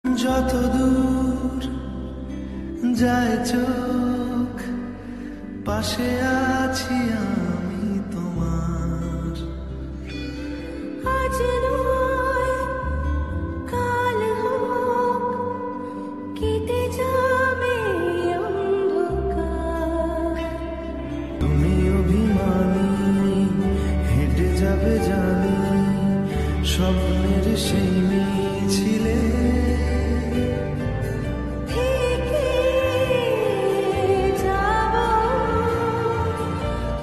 Bengali Song

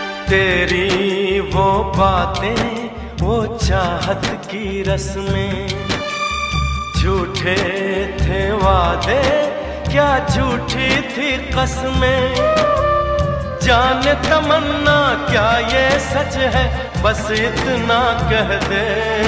Hindi Sad Ringtone

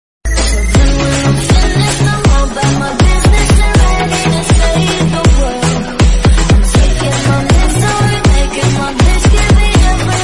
Pubg Song Ringtone

